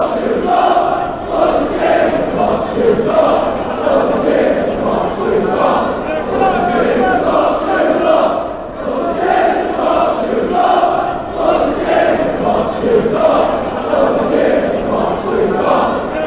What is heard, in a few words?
shoes off, chants